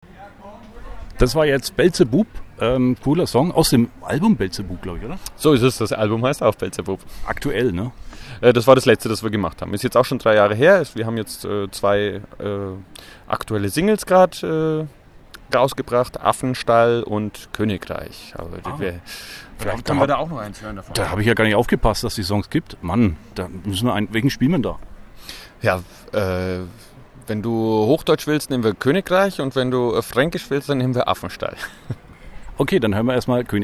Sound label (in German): Song Koenigreich